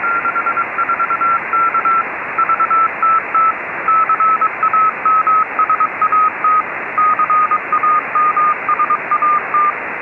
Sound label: xw-2d cw beacon 145mhz853